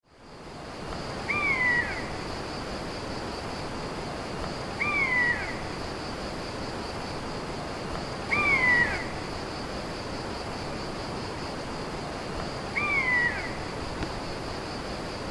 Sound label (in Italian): falco in faggeta; Poiana; Ascoltando il bosco